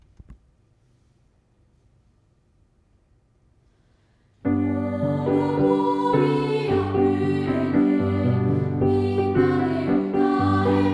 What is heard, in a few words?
chorus; gakuenka